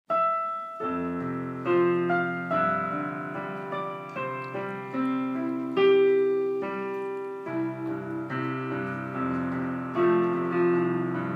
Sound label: Hymne instrumen